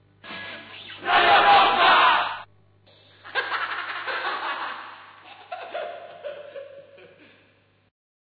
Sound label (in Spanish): grito risas